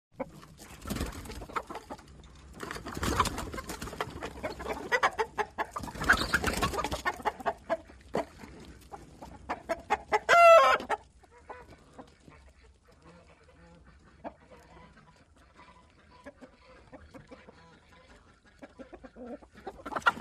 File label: CHICKENS BARNYARD CU CLUCK SQUAWK FLAP; wildlife sounds